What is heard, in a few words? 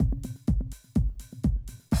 125, Classic Drum Loops